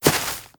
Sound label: Grass Walk